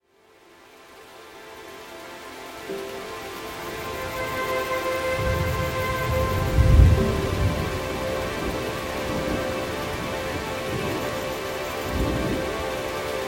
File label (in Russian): zvuki prirodyi dlya malyishey zvuk morya; gluboko spat